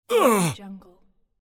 male grunt

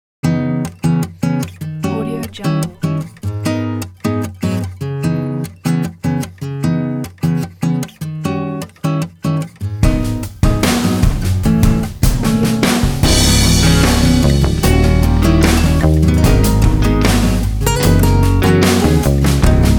funky jam session

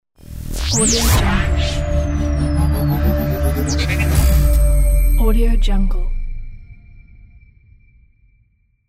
a logo; archimusic

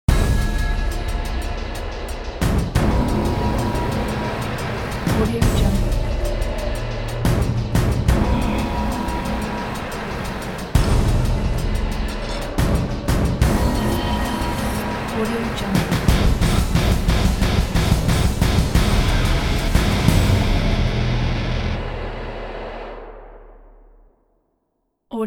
past tense logo; sound-ideas

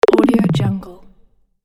infographic power sleep

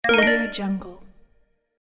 pick coin

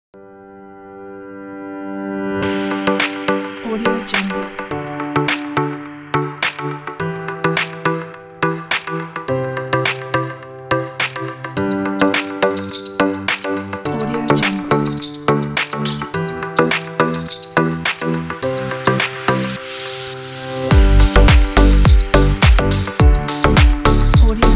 positive pop

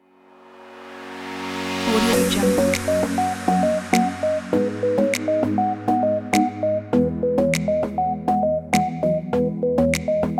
energetic upbeat summer kit

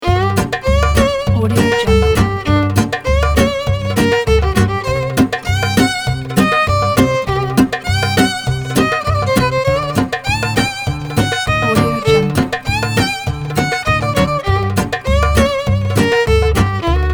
jewish violin

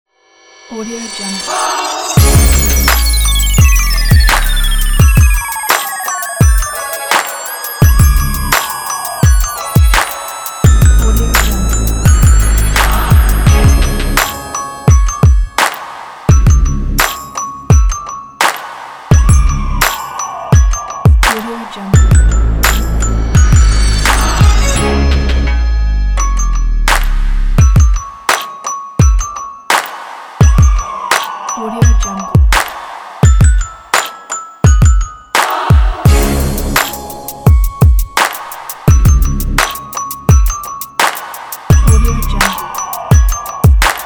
dirty south

